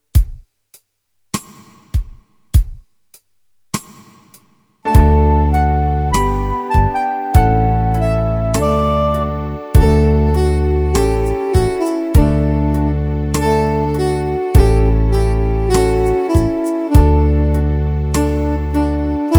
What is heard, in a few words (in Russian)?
Pesnya igra s pogremushkami i kolokolchikami